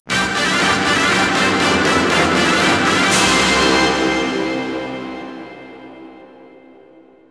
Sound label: Str G#